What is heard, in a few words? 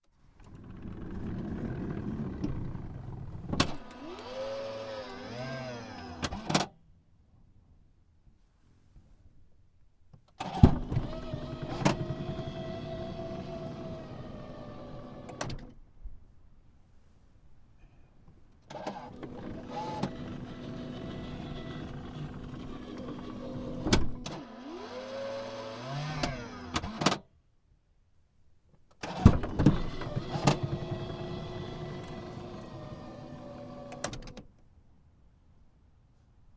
engine and power windows